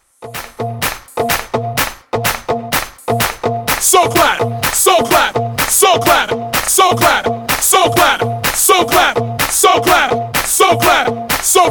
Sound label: Wordplay Segue 126Bpm